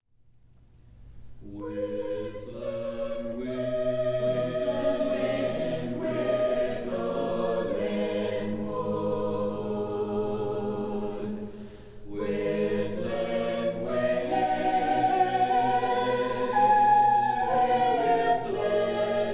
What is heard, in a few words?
Whistlen Wind